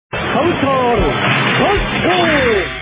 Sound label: transform